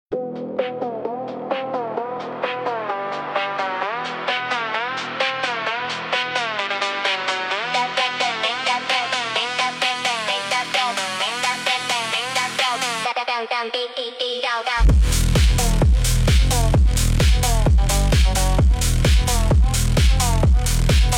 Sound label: Phonk